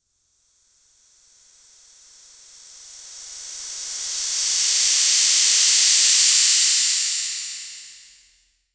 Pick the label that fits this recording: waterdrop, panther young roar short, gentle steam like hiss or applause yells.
gentle steam like hiss